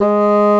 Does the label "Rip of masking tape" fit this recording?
No